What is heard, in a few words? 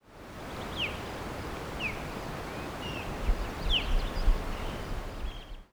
osprey, eastern washington